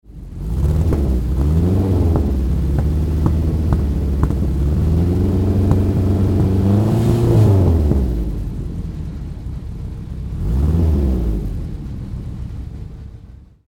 Maserati T61

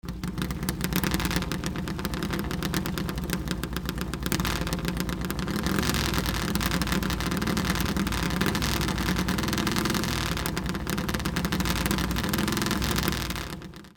allard hemi idle

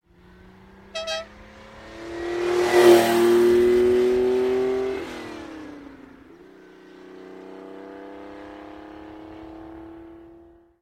ferrari dino

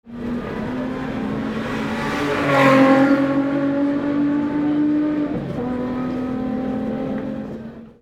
beetle

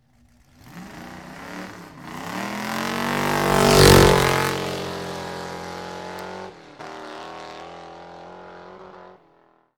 allard hemi

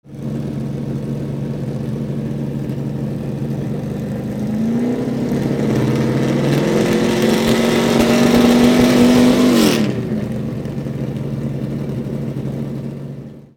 nascar rev, engine

